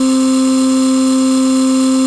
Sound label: voice